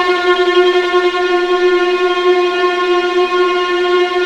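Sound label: VLA E2 TRM, viola tremolo, The Ultimate String Collection; East West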